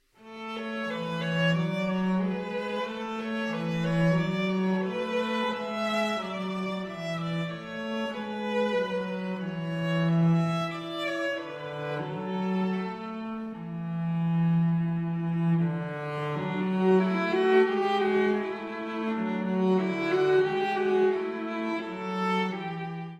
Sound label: Slovakian Song, Duos